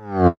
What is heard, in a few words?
shrink